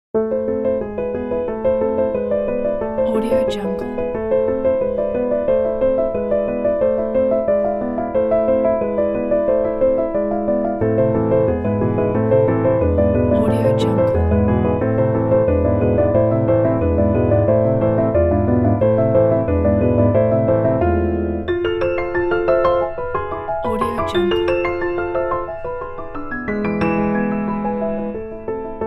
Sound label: anxious piano